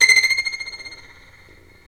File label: STR Violin, Solo Violin FX